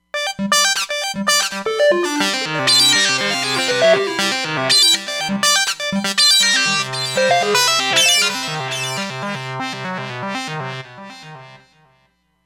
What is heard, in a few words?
Tekno Tone, Roland Fantom XR